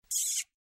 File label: kiss